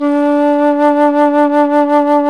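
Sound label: Alto Flute vibrato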